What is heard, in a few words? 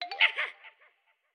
Skeleton Wind Up